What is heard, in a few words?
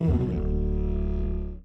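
Music Human Volcano 145; From Dust; PS3; Xbox 360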